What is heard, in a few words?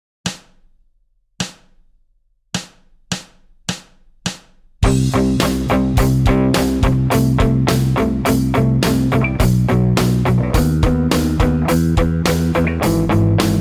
rock backing; 小指の運指を活かした譜例; ロック; ヤング・ギター８月号